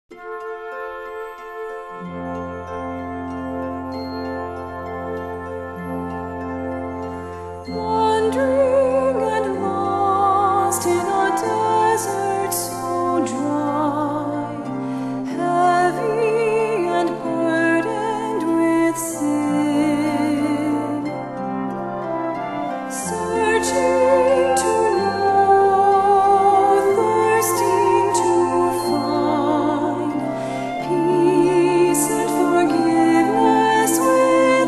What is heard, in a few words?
Choir And Orchestra